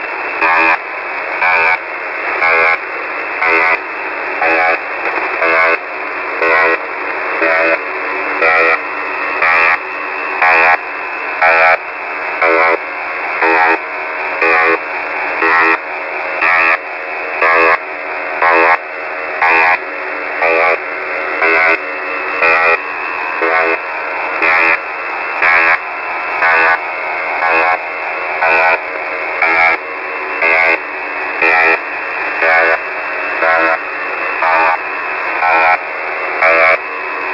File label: channel marker; Unid station; Unid station. Various modes and bandwidths. Heard on many frequencies. 2023-09-28, 2040 UTC.